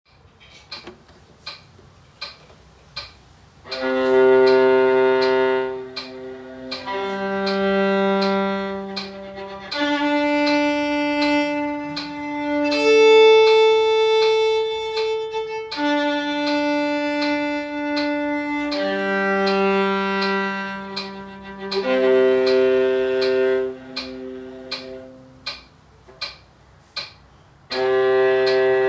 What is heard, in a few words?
ghost y crunch, viola tone